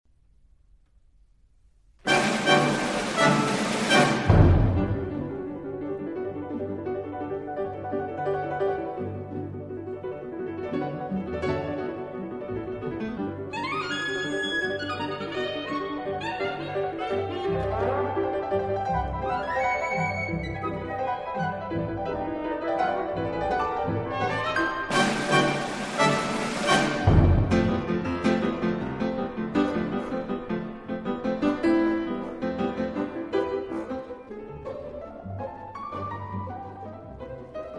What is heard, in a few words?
Piano Concerto; ravel